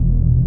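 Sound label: proximity mine; lander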